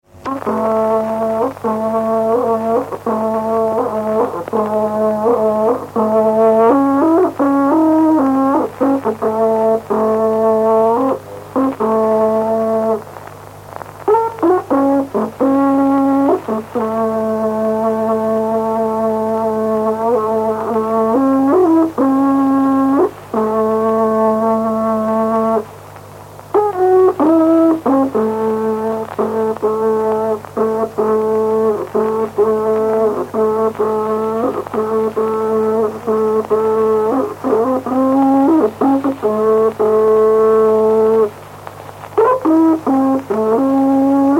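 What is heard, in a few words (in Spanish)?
Toque de trutruka, Mapuche; Música Mapuche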